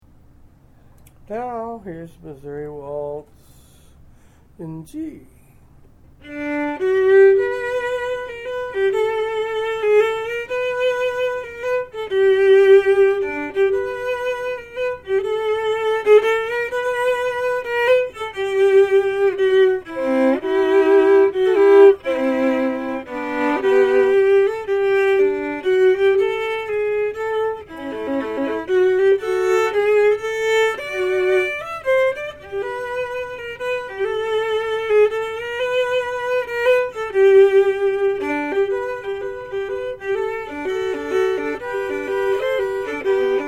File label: Waltz G, Fiddle; Fiddle chords